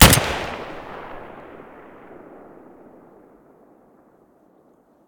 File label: svd muzzle stereo, weapons